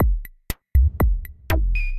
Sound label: Arp2600, Drums; Ableton; DM ARP 2600 Drums by Flatpack